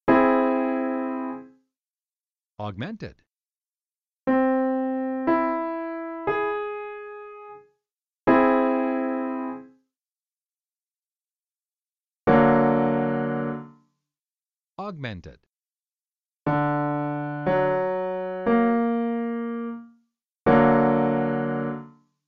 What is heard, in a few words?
Augmented triads